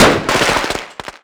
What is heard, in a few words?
firework